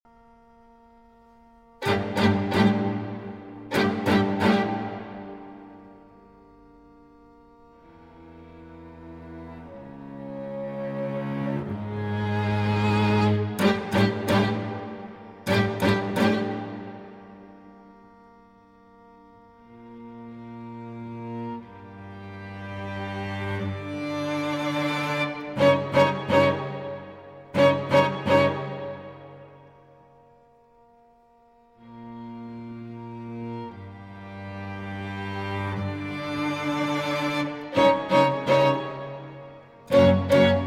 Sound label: String Quartet No. 8 in C Minor, 5. Largo